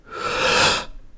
wakeup

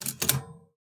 typing; outfly; typosonic

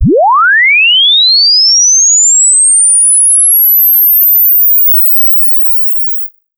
stereo sweep 1Hz 96KHz

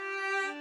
violin G4

